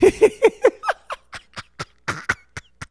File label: laughs